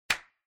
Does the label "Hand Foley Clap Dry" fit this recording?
Yes